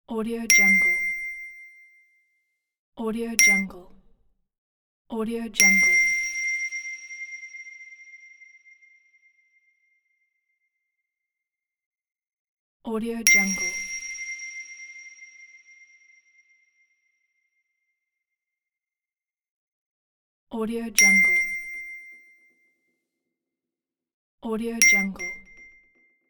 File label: ding